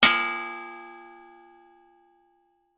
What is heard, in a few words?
industrial gas cannister large hit ping